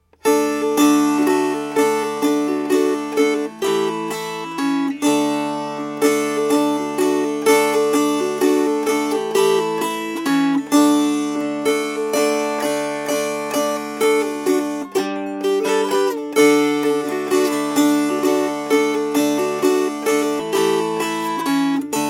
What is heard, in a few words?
Strummed